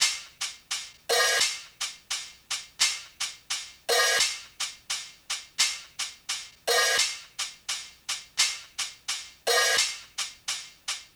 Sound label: Hihat Loop - 86 BPM; Drum Loops; Cymatics; Eternity Sample Pack